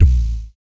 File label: VOC PERC, Vocal Planet Jazz FX BASS PERC